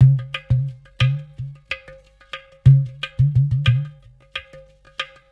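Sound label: Ghatam, World Groove; Ambient Grooves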